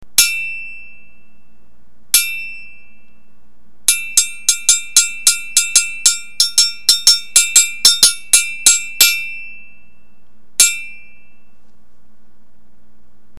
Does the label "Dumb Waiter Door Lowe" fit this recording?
No